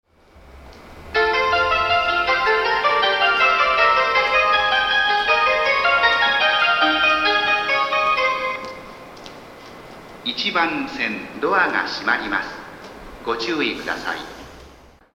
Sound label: ebisu melody, Tokyo Train Tunes; japan